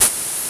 SHHKE, SONIC DRUMS; Greytsounds VOL-1

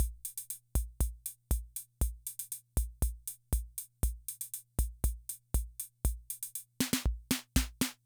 Loop, rhythm 55, classic machines

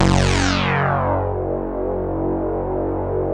LEADS; Masterbits; Vintage Voltage

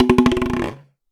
fooley; M8; percussion and foley

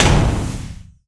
POUNDING; Rarefaction; Poke in The Ear With A Sharp Stick

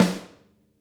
SNARE, Interactive Drum Kits; Sonic Reality; ID SNARE 1+

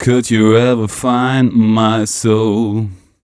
m 127 mel a, Vocals

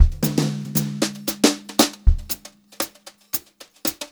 Brushed Drum Loops, JAZZY; USB Soundscan vol.39; AKAI; 90 s Sample CDs

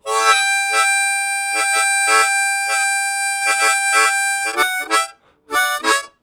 Vamping, Harmonica; Zero G

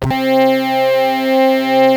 PPG C3, synthesizer; Hollywood Edge; Giorgio Moroder Rare Synthesizer Collection; PPG.2.3

